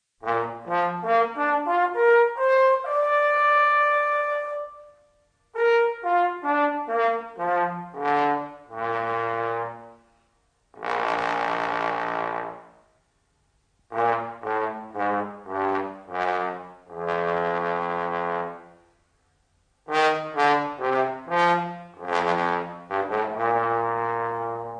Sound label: trombone